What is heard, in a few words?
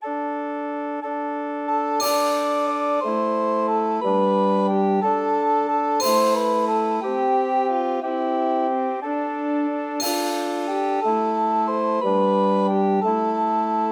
Scarf Song and Dance; MTWFC VOL2 Parts1&2 Audio Accompaniments